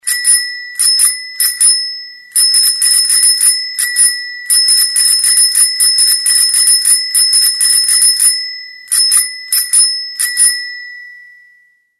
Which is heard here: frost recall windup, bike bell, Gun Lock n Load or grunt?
bike bell